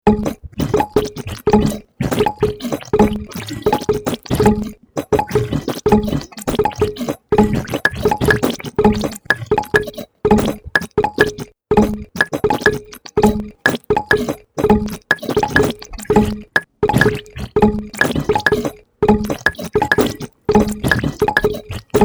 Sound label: bursting jam